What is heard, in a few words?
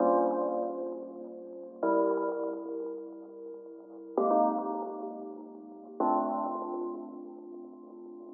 115 BPM F Min Keys; Loop Stems; Cymatics; Lovin U